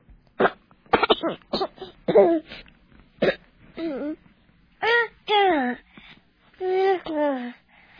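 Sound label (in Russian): кашель детский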